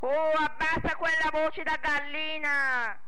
voce da gallina

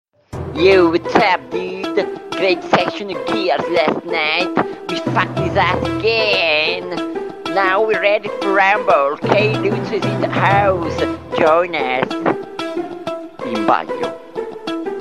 rap

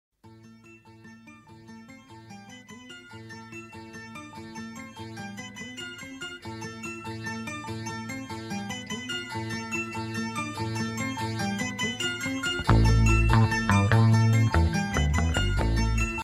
suspance; pacco

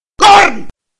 corno

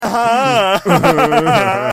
risata; harry potter

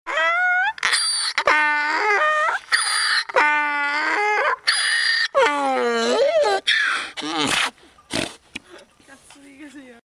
asinello che raglia; elfo

